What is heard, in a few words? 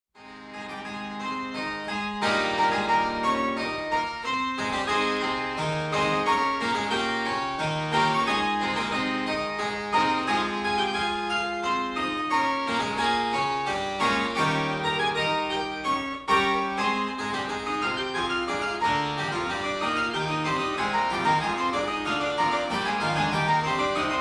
Well Tempered Clavier Prelude in Fugue No.17 in A flat major; JS Bach